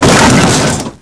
crate, impact

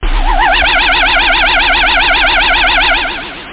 adjuster, 303; da capo vol1

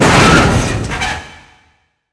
critical hit; Earth and Beyond; EA Games

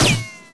Gun Expire; Net Power 2000 #2

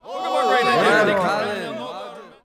men murm; Gamestar

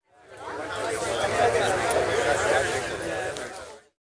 CROWD; commercial

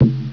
rubber

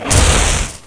MATCHLIGHT; BANZAI

